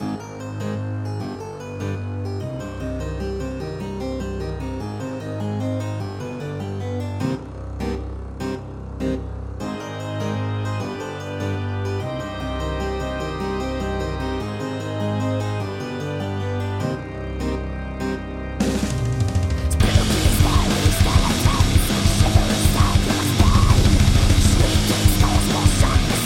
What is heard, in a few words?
Black Thrash Metal Cover